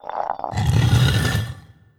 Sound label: Swipe